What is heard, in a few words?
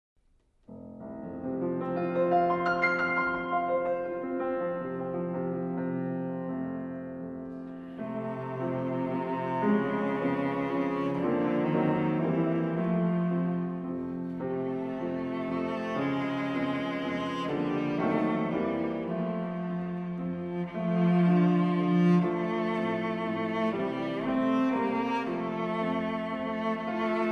cello sonata